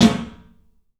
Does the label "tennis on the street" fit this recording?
No